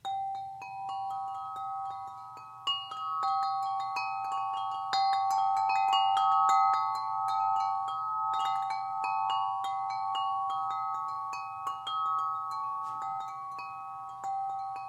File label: wind chimes; Bronze,Gold & Silver series; Ocean Breeze 30in